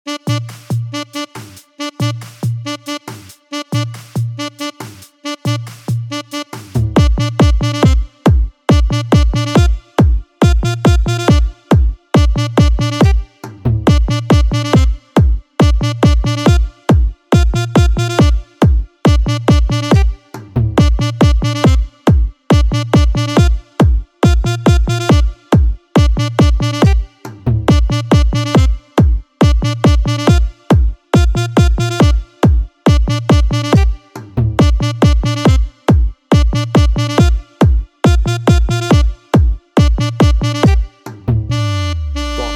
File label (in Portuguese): trompete pancadao automotivo